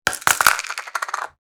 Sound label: Plastic pill bottle falling on floor; medicine